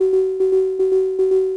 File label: ramp beep; Bermuda; Splashdown; XBOX; Soultree